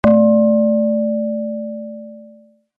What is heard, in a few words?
tone